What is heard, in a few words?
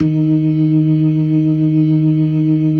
LO MANUAL VIB, Organ